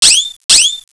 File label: Zwww Zwww